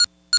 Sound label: shuttle beep; trek